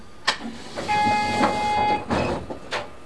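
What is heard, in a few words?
Door Open Left, Train; OpenBVE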